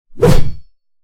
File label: sword strike, warrior; A succubus is born; combat